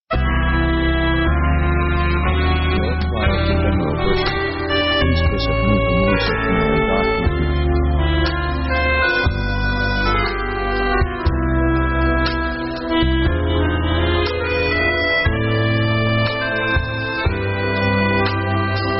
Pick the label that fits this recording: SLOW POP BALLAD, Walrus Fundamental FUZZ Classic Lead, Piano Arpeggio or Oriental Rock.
SLOW POP BALLAD